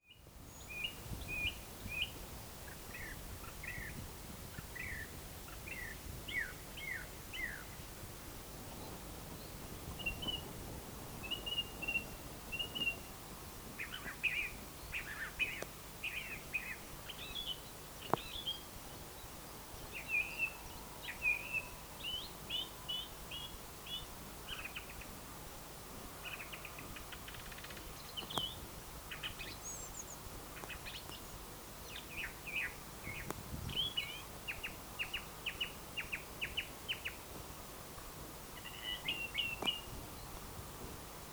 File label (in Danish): sanger, fuglestemmer; 1 maj